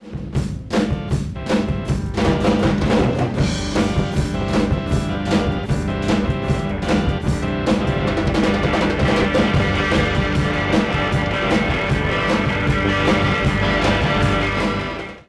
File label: Rock